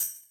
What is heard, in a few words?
percussion, Millenium MPS-400